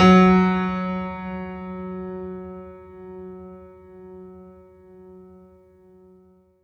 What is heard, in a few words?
F#3, Synth Line